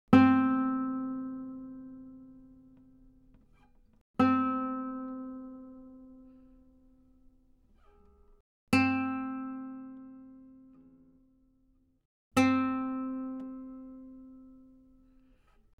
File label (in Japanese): 撥弦; 弦鳴楽器; 河野賢; C4